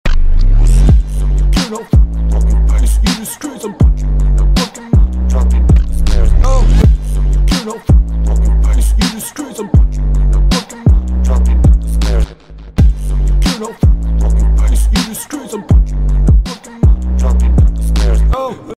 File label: Radio Song